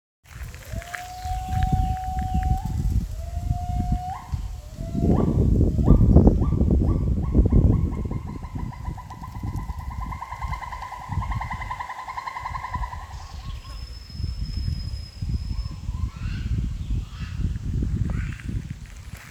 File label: Adult gibbon; Pileated gibbon; Wildlife Alliance